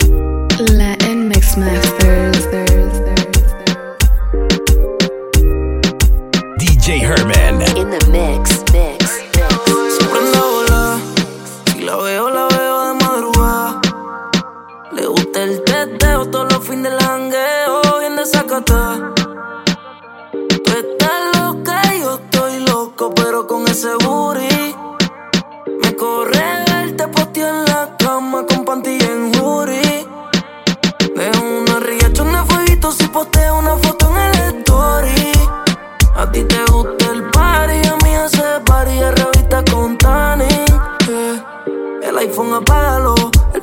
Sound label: REGGAETON VS EDM